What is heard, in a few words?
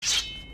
sword swing sound effect; skyrim